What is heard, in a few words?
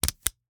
Slap bracelet, smack, slap, whip, felt, plastic, hit, roll up; Eiravaein Works; Vaeyan IV